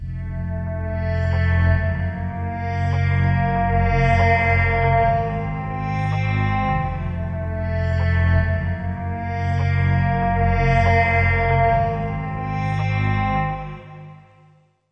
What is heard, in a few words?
some climbing bass stringy; SAMPLED MUSIC